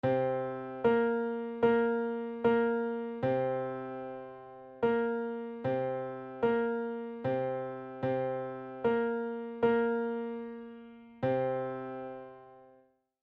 minor 3

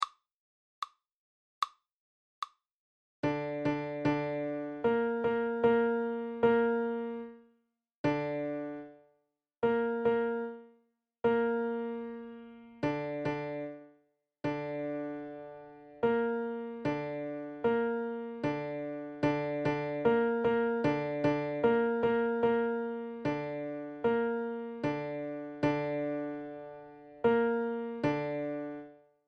minor 3; intervals

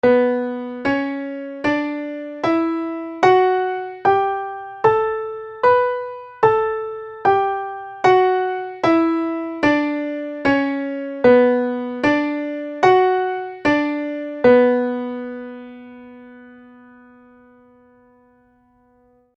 tuneup b minor; minor keys level 3

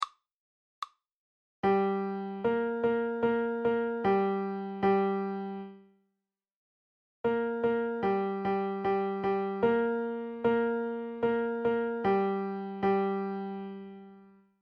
minor 3; minor third; intervals minor 3rd exercise

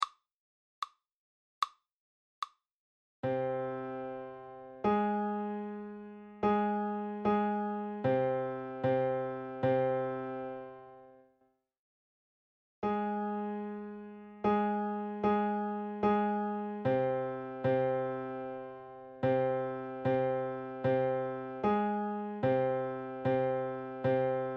minor 3

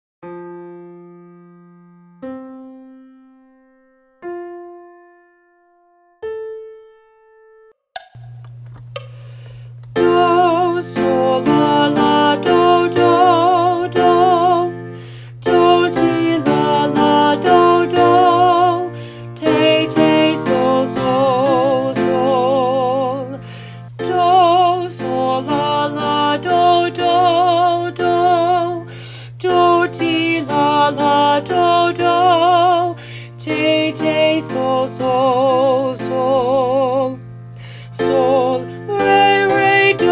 alto solfege; lasso; o occhi manza mia

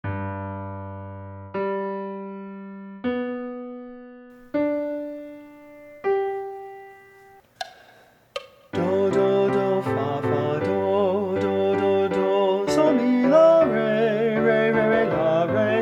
now is the month of maying tenor solfege; morley

